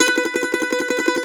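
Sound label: MANDOLIN TREMOLO, Plucked Strings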